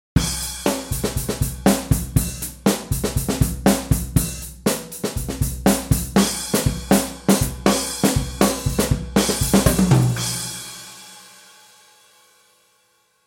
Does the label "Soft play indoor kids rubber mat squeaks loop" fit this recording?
No